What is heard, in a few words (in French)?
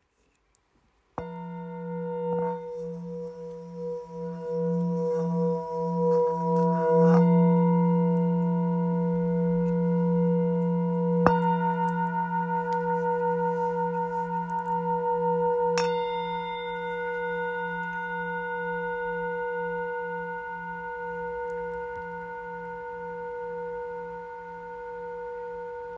Thérapeute la dièse